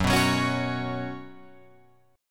Gb Suspended 4th Gb down Guitar Open F, Gbsus4 chord; strummed; JGuitar